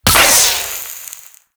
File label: Heavy Shotgun Projectile Fizzle; Warframe; Tenno